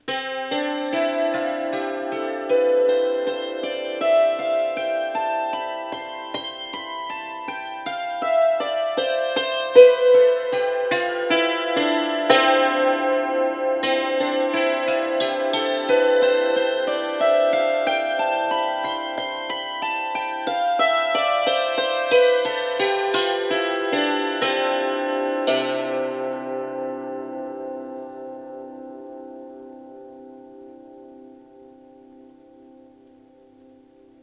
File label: stupnica, dulcimer